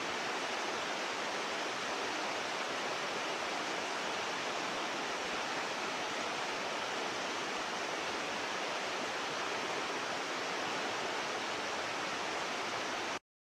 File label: woodpeckers; Hi8